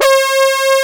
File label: BRASS, FUNK